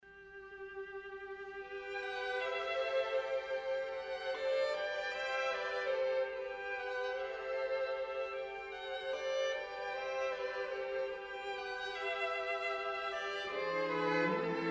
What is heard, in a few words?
sextet; gliere